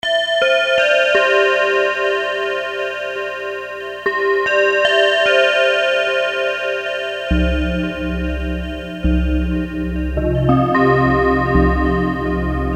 Bells, Casio CT-370; Tones

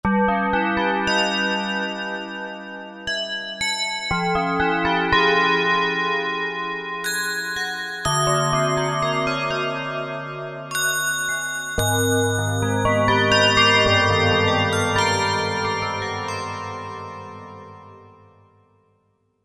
FM Tubular

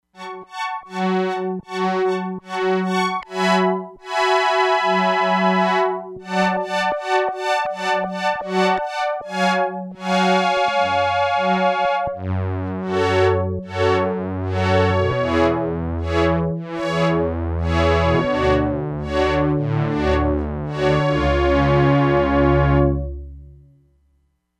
STRINGS, Crumar Bit 99; Factory Programs

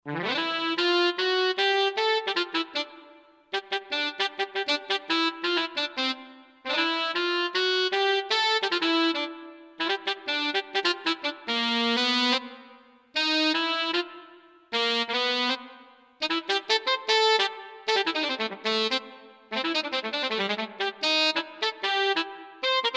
Latin Alto Sax; World; Roland SR-JV80-18 World Collection Latin

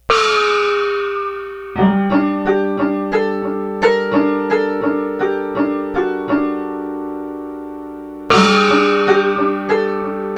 hammered piano gong; FU YIN GONG

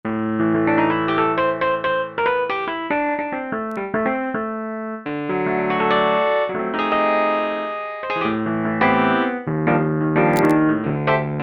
Lo-Fi Wurli

